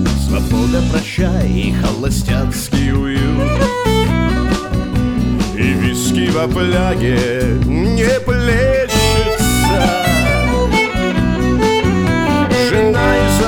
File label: russian rock